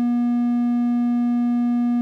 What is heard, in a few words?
wav Triangle; Various waves generated by the AY, ADLIB and SID; Z80